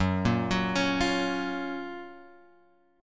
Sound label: F sharp Major 7th, Guitar, Open G